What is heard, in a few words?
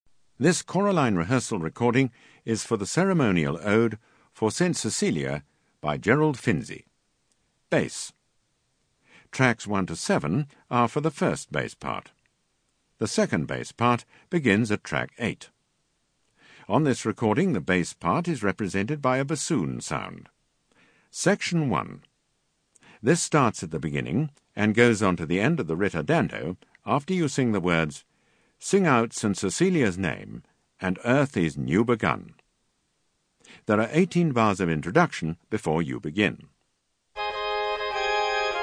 Bass; Finzi For StCecelia